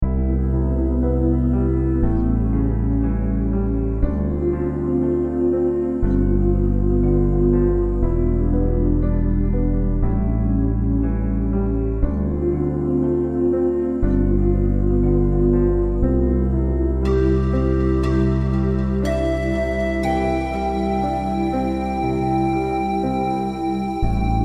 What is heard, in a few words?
Romantic Piano Music and Native American Flutes for Relaxation; American Indian Music; Spa